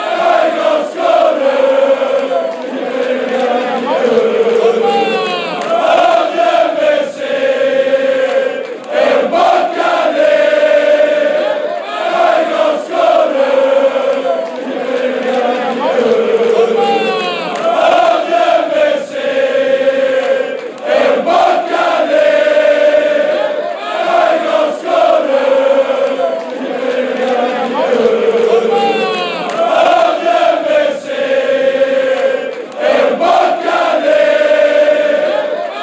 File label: song fanchants; dieumerci mbokani